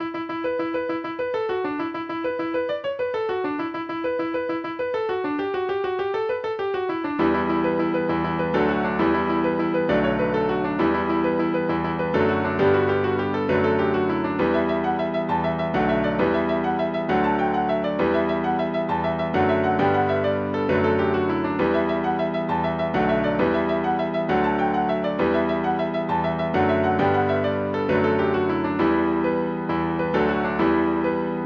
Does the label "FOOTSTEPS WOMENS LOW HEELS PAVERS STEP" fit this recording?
No